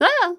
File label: Spa Hit